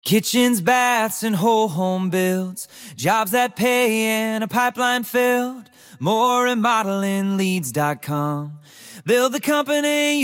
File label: jingle